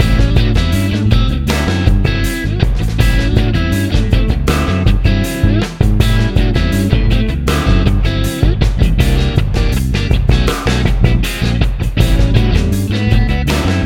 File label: big drums blues guitar